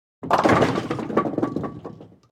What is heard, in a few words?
Bowling Pins Are Knocked Down Close Perspective; Sports